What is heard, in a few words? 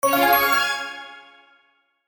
chess End